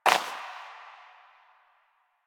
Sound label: Step Dirt; Warframe; Koumei